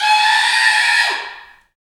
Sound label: Bullhorn, Afro Horns, Africa